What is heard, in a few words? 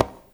CONGA, Percussion; InVision Interactive; Percussion Slam; BOLERO